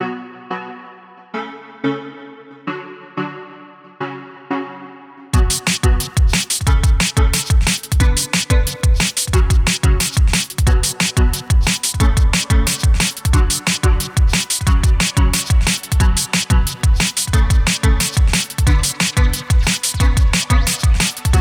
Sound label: Level complete; Hyper 5; ps4; xbox series xs